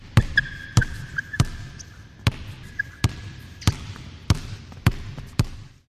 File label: Ball bouncing indoor basketball court